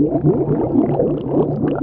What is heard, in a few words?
Under Water Loop; Effects; worms